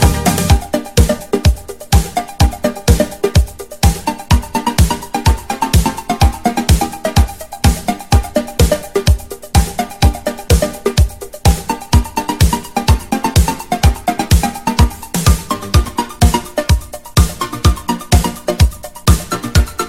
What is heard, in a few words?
Remix